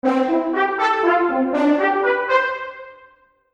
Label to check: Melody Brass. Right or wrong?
Right